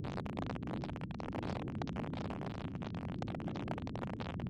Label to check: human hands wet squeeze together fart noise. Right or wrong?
Wrong